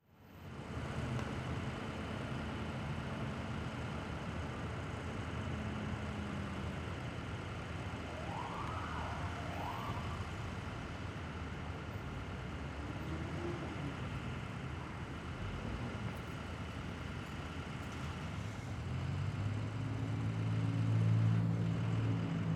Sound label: Ambience Traffic With Engine; AdobeCC